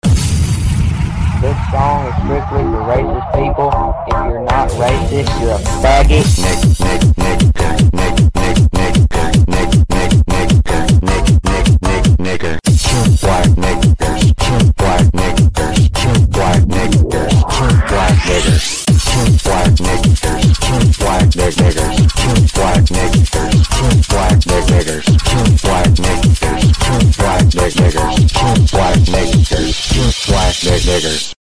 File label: neck hurt hard bass